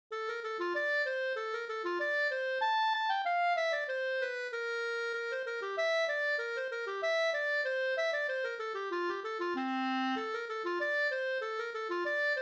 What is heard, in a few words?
polka